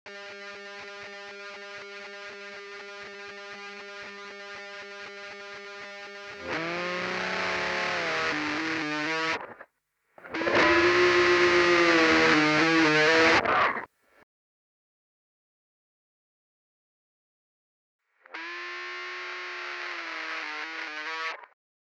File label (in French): guitare solo